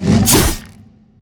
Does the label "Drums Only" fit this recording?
No